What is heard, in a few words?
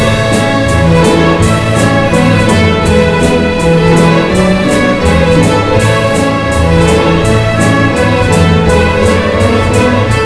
retreat